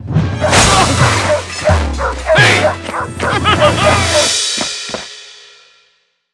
Guard Intro Base; subway surfers city sound effects; Android, iOS

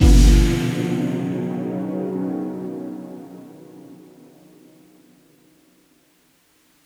Level End Stinger; Breakout Beyond

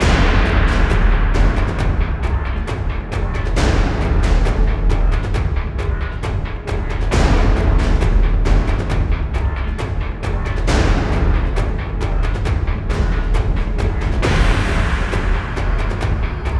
Base Loop low

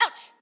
Hurt; Jackie

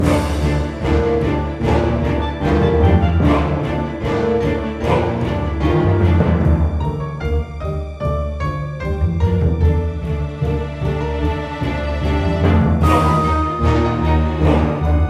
Caves build; Don't Starve Giant Edition; PS Vita

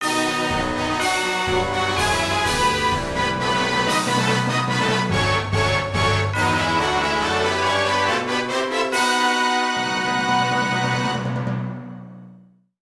Complete; Kuusen II; PS2

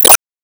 Bounce Ball Hit; gamerip

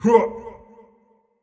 Effort Short; Marv

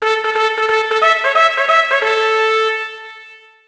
Ditty; NBA ShootOut 2000; PS1

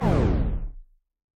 Boss Core Death; Avie

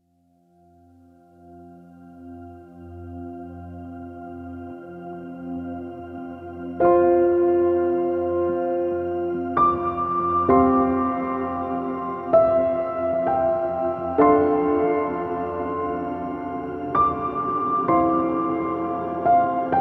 Sentimental Piano

